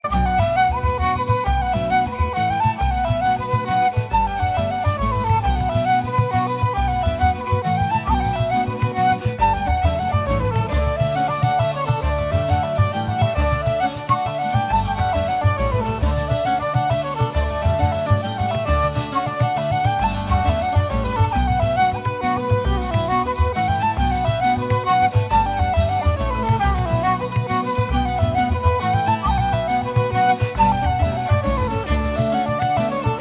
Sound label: Slip Jig; Humours of Whiskey; Trad.